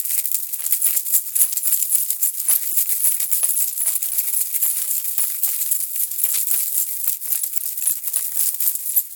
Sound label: Guard Dog Chain Run Loop